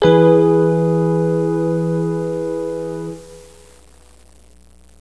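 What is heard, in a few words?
piano rhodes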